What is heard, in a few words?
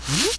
Quick zoom; Chabyrinthe teaser